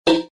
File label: distorted metallic hit